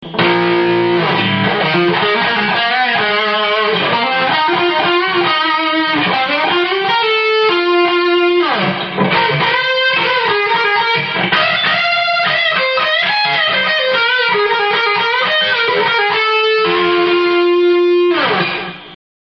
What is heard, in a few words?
tube